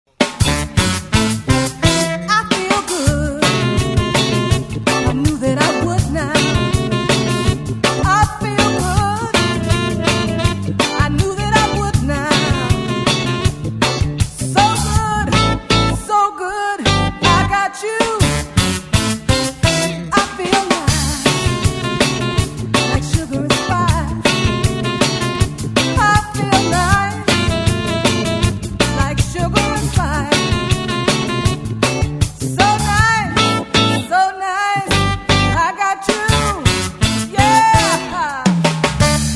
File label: funky